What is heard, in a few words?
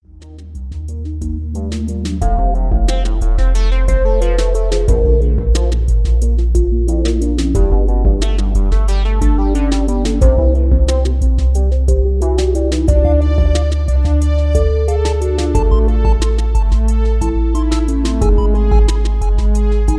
Electro Chill